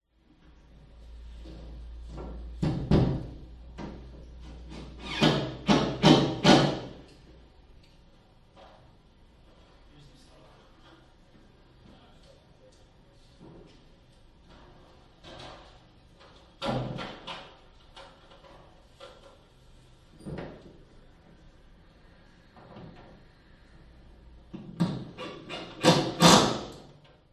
industrial electrician working drilling wall in other room office room reverb 5m; 5m distance; zapsplat